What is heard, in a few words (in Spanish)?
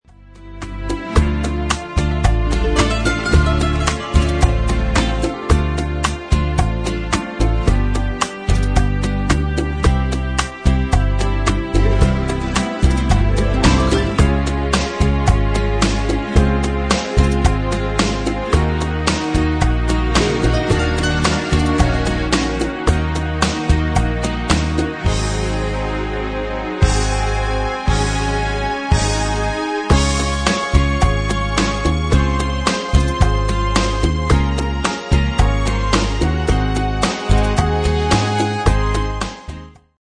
Bachata